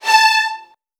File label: VN 16TH, 3A3; ILIO; Kirk Hunter